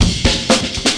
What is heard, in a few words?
Break, Drum N Bass Percussion